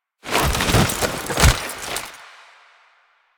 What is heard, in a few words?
Stand To Prone, Foley; Lasrian Lancer; Warframe; Lasrian Soldiers; Stance